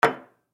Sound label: Air Freshener Can Set Down; Hotel Room; Set Downs (Sound Effects:Objects); Foley; Blastwave